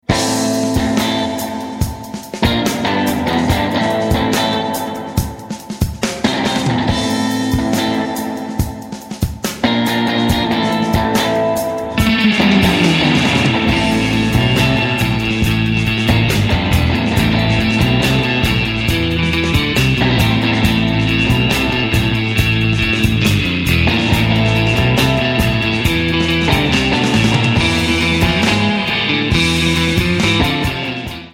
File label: Surf Guitar